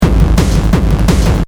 gabber hey drum; electrohead

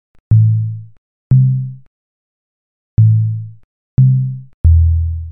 Chilla Pure Bass; Serial Chiller

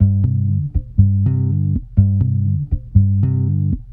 Chilla Acoustic Bass; Serial Chiller

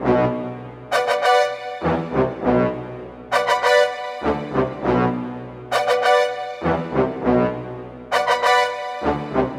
Big Brass Hip Hop Intro

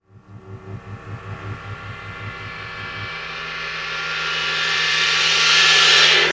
Reverse Cymbal FX; Serial Chiller

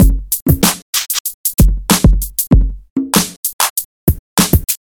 Beats

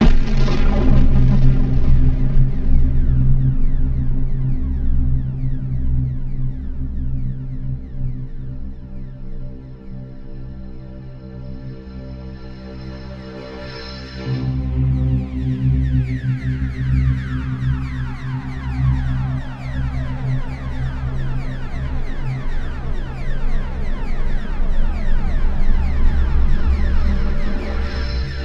Great Atmospheric FX

